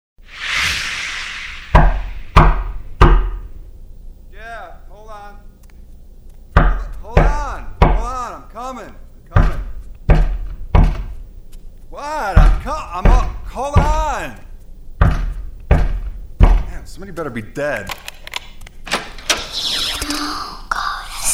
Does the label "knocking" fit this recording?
Yes